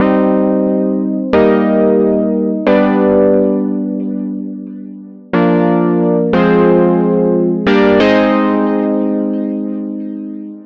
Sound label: lead